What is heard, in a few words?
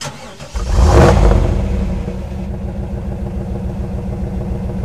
Z28 Start Loop; Automobiles